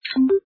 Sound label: Correct